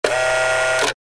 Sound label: servo action style